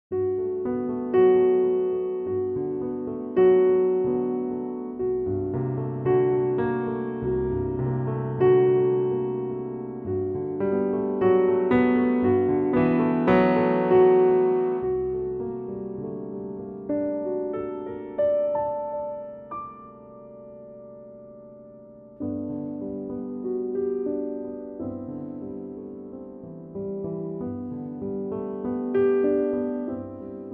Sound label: G Piano